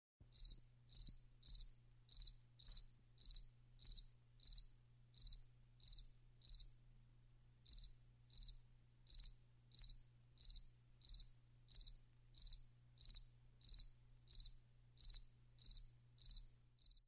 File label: Crickets